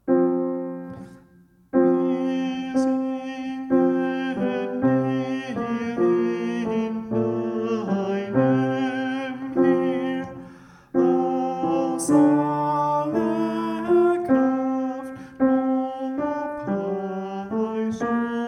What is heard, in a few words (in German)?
Wir singen Tenor